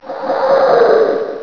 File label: door open; halloween cemetery